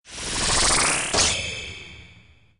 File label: hatch egg